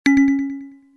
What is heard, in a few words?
bell